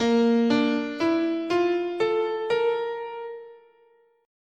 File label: Bb Chinese, scale